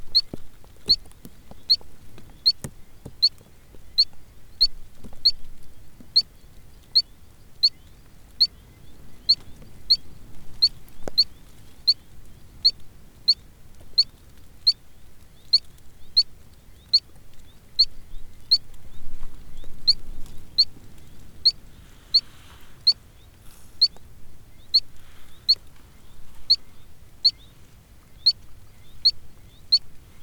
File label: Allobates chalcopis; Dewynter